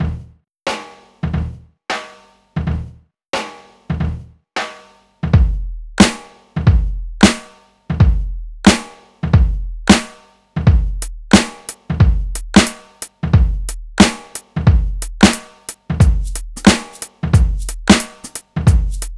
90 Smacker, breaks; Lockdown Breaks

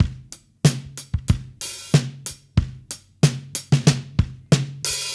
drums fill, analog funk; Mixed Berry Jam; Sonic Foundry (Sony Creative Software) - What it Is! 70s Analog Funk

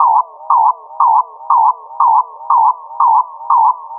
Jazz Noise; Sonic Foundry (Sony Creative Software); Leo Cavallo Bass Taster; Special Fx

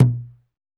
UGANDA DRM, UGANDAN; Spectrasonics; Heart of Africa 1

